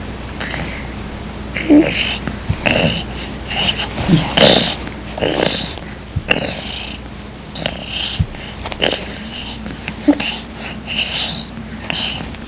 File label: voiceless babbling